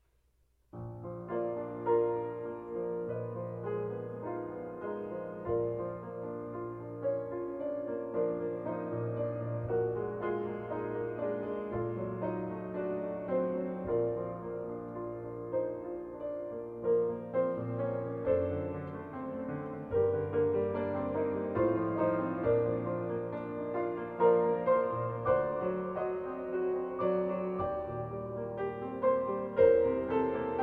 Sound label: Piano Concerto; Rachmaninoffs 2nd Piano Concerto